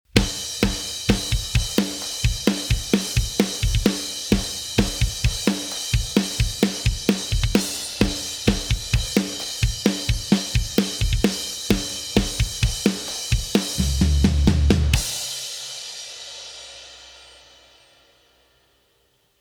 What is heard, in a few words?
Tight 130bpm